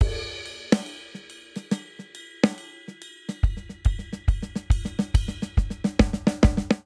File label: Swing Jazz Buildup 140 bpm; MIDI Clips; Ableton; Factory Packs; Drum Booth